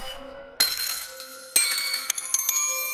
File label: banana slip